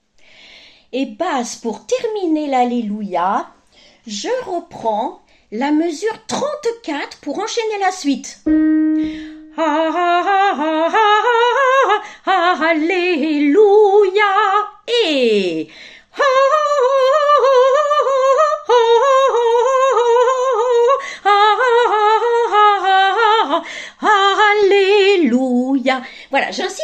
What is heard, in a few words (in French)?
BASSE; BUXTEHUDE